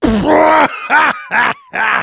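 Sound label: laugh happy, German; Heavy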